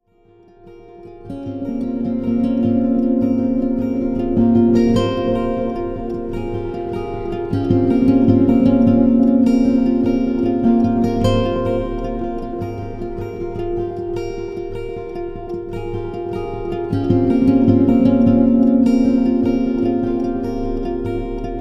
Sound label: guitar emotion